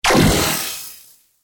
Laser Cannon SciFi, Laser Cannon Futuristic High-Tech Blast Static Crackle; Alien Gun; Lasers (Sound Effects:Science Fiction)